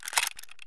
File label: ak74 slider jam; Escape from Tarkov